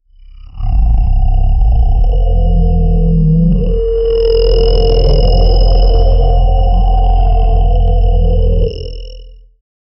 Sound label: bear noises robotic groa; charge